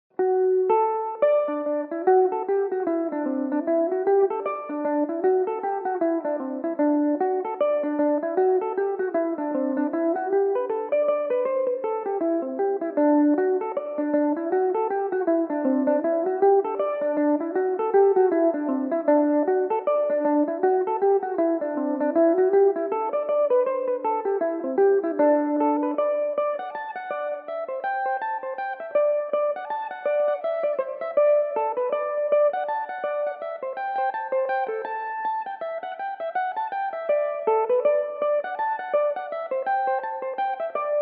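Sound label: D mixolydian, irish mandolin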